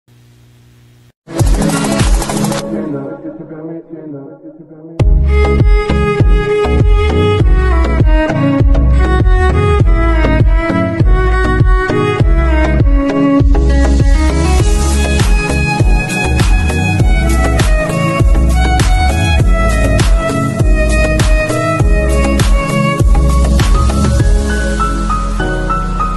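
best sad background music ringtone english background music ringtone sad tune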